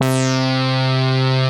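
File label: quadra alpha saw; Synth Keys; Quadra Aplha Saw; Ableton; Creative Extensions; Poli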